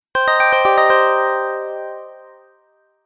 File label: Mystery Notification, beep sound effects